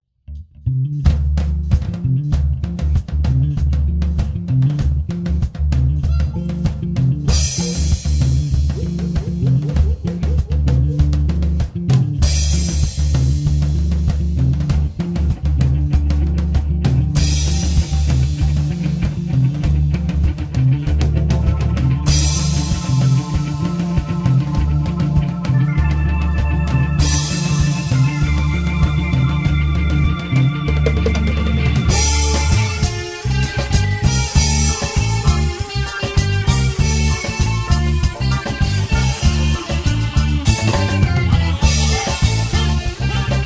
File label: cumbia